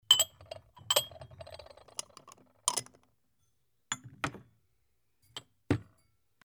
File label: dish